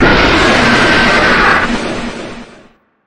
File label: jumpscare sound; nightmare nights